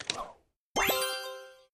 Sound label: keyboard letter click jump right answer